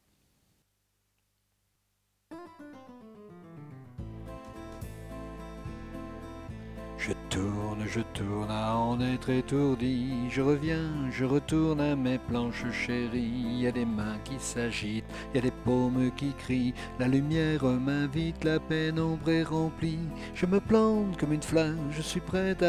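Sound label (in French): Je tourne soprano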